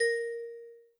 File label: Key